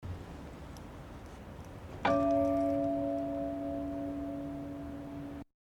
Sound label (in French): cloche; Avranches